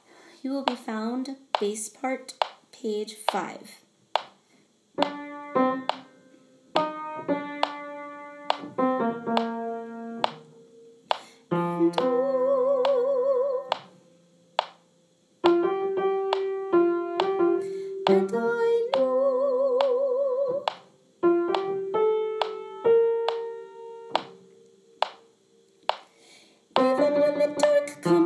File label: Bass; Broadway Shabbat